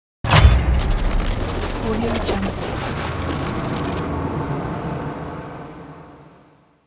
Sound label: futuristic intro